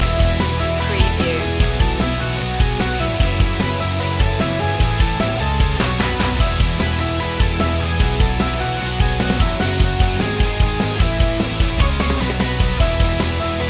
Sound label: rockin loop